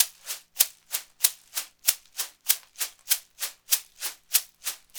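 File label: rainstick, percussion; ILIO; Synclavier Percussion 2; World & Orchestral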